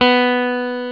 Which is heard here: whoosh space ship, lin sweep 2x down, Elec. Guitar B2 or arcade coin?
Elec. Guitar B2